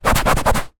household couch wipe arm fabric clean cloth; zapsplat

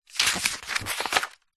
household book thick page glossy turn; zapsplat

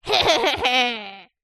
human child boy 8 evil laugh

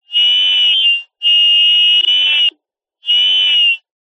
technology cb radio two way feedback

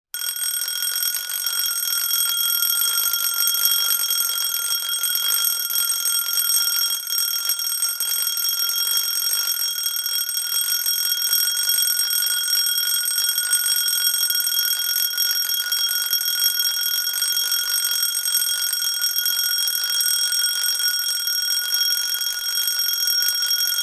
emergency bell alarm small ring

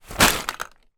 toys bag of toys put down

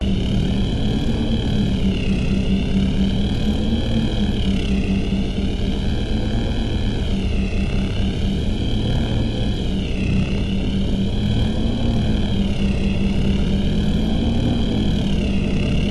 Industrial Glitch Drones; sound spark

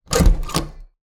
Door Bedroom Open

